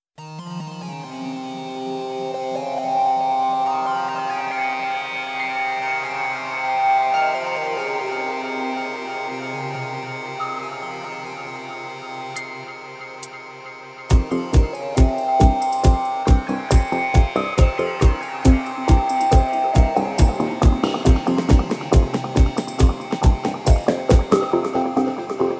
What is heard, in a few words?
DUB